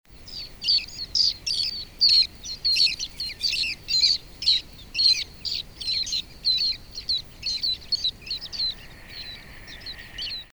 Western Sandpiper, Calidris mauri; shorebirds rails

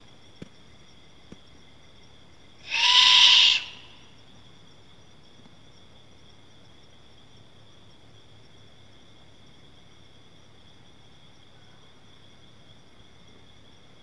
Great Horned Owl, Bubo virginianus; owls

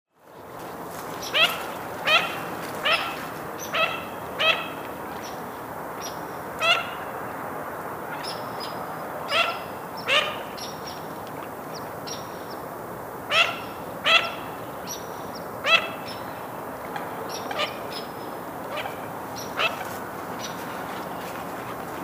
Green-winged Teal Anas carolinensis; waterfowl

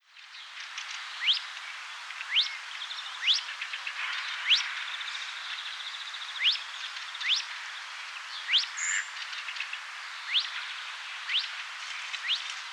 Mandarin Duck Aix galericulata; waterfowl

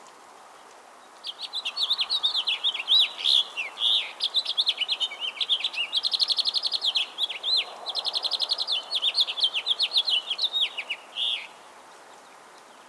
House Finch, Haemorhous mexicanus; finches

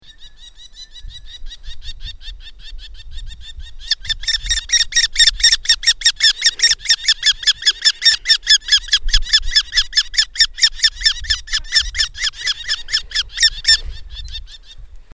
Merlin, Falco columbarius; raptors

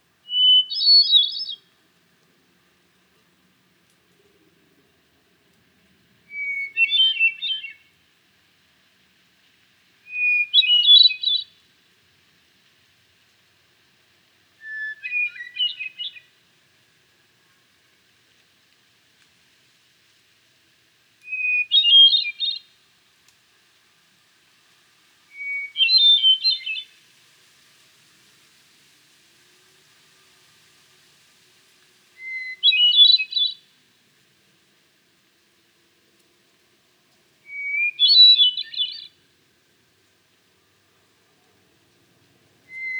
Hermit Thrush Catharus guttatus; thrushes thrashers

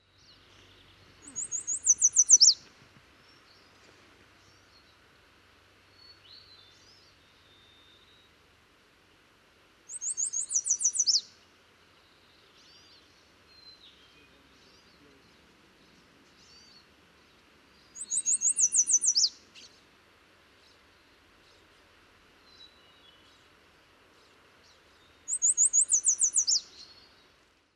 Yellow Warbler, Setophaga aestiva

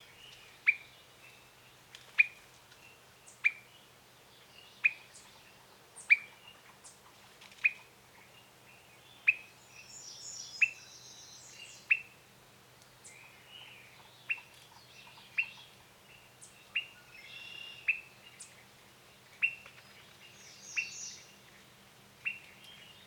Swainsons Thrush Catharus ustulatus; thrushes thrashers

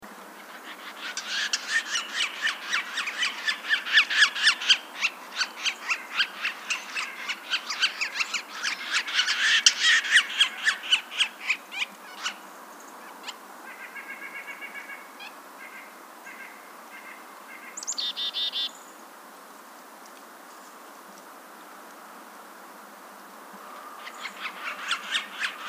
Yellow-bellied Sapsucker, Sphyrapicus varius; woodpeckers

